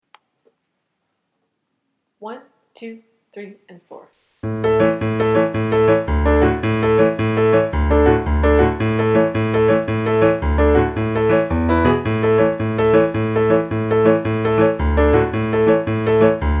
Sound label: Piano At Tempo